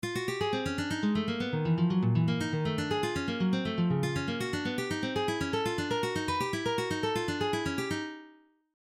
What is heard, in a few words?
fast picking see it